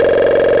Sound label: bubbles